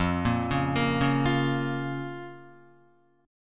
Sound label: F Suspended 4th Sharp 5th F down Guitar; arpeggiated; 4F,4A,5C,5G,5C,6E; JGuitar